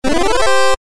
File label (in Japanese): 勝利 ファンファーレ レトロ